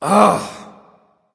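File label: non-verbal ugh; smite; voicepack; houyi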